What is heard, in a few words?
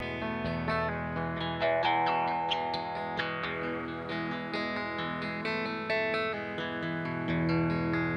Loop